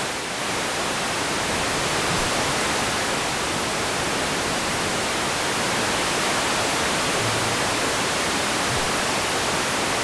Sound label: meadows